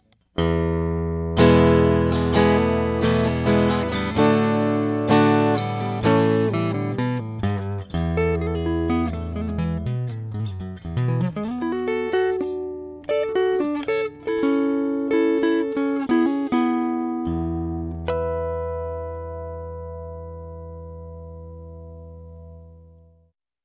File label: tibet ending, T5 samples